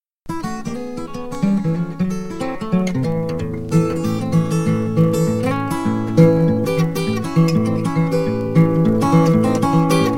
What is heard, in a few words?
Haag City Dance, ragtime guitar; how to play ragtime guitar